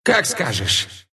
move pain, ru; Dota 2; Monkey

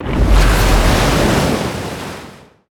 Mirror Image

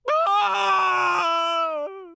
Falling; Khan; Paladins

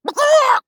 Chicken; Warrior; Realm Royale

